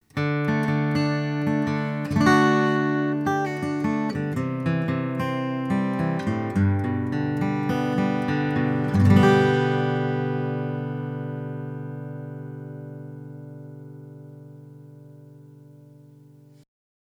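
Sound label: Acoustic Guitar Zoom F8 Picking Beyer 201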